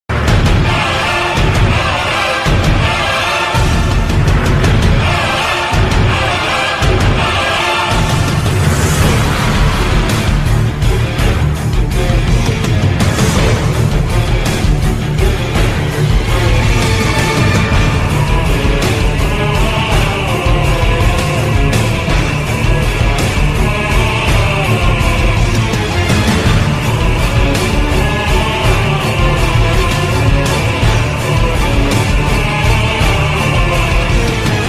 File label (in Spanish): asesino musica